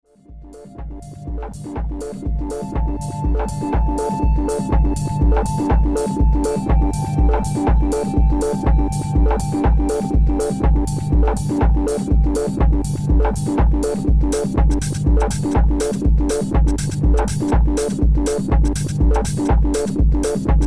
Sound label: percussions